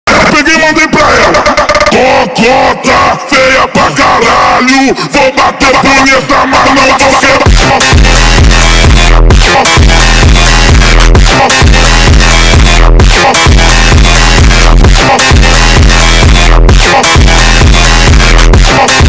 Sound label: Montagem